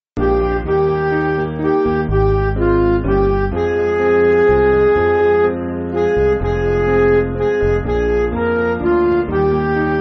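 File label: Music Backing Files for Churches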